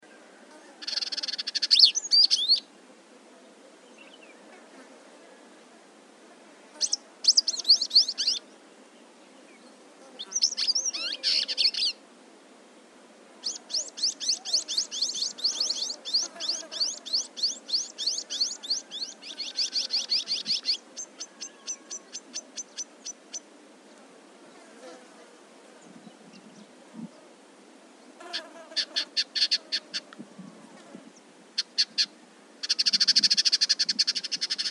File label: dusky indigobird (dusky twinspot mimic); extensive mimicry of Dusky Twinspot by Dusky Indigobird; Vidua funerea; Angola